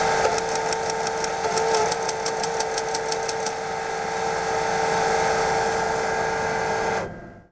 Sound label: cashnomnom, atm